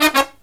Killer Brass Riffs, 108 soul; USB Soundscan vol.29 - Killer Brass Riffs; AKAI

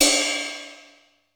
XE8 RIDE, drum; Crypton - DrumBase5000

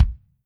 KICK, Drums Percussion; Kick 1 ModuIe

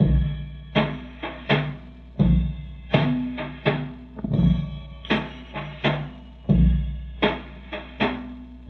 Loop, rhythmate, classic machines

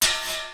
INDUSTRY HIT

